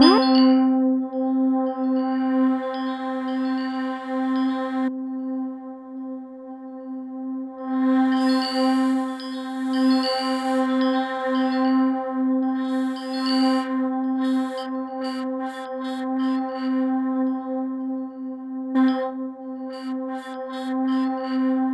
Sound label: C4, Synth Line; 90 s Sample CDs; NiceBeats; SynthLine VOL-17